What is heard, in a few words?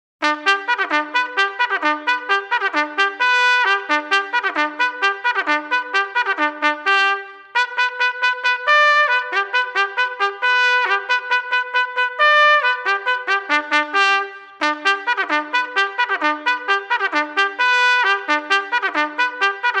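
Reveille, bugle call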